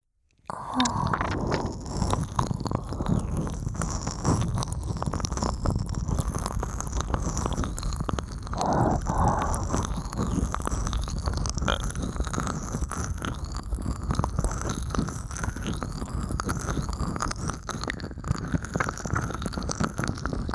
【極】両耳ぞりぞり耳中舐めMIX; ASMR

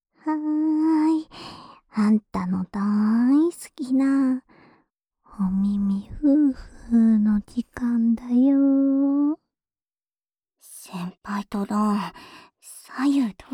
耳吹き／二人同時（左右逆）, ASMR

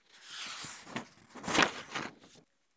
本をめくる

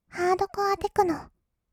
ハードコアテクノ; 1200 kawaii voice!!; 音楽ジャンル 56種

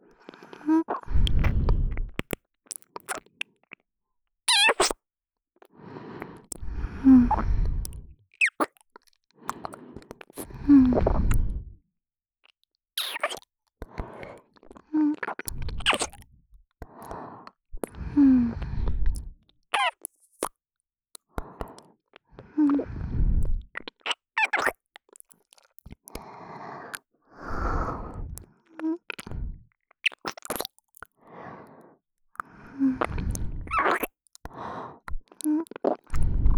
ちゅぅ…ちゅぅっ…（離す音）, ASMR, 右耳

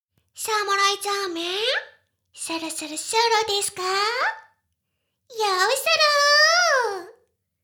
シコシコ音声, ASMR; 魔法少女マスカリマッスル

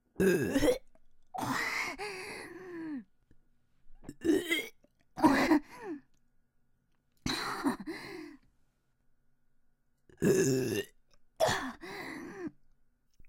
ゲップ集

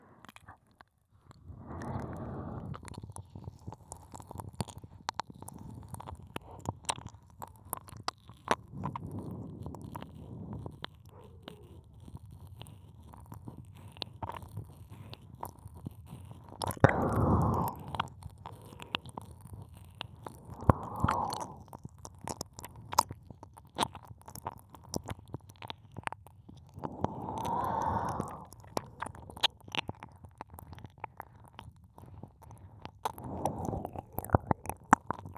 耳舐めループ左右同時

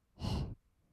へ, ブレス 吐く音; ASMR; 1200 kawaii voice!!

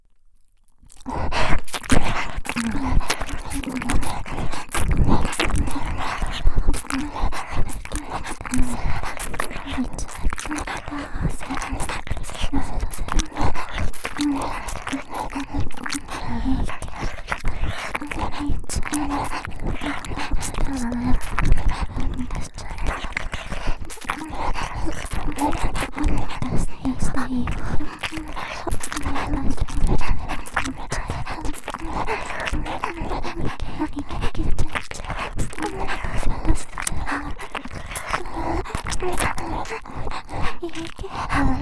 お耳舐め イケイケ連呼 激しめ

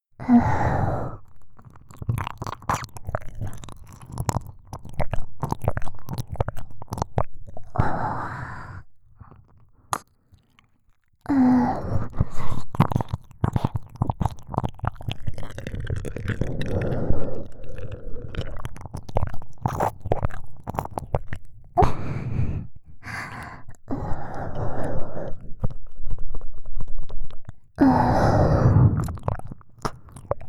極深耳舐め片耳

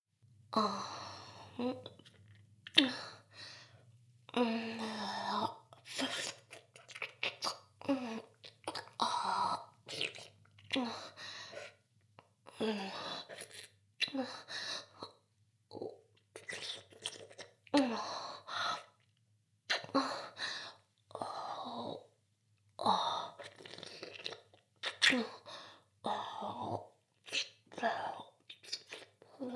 フェラ; ダウナー風俗

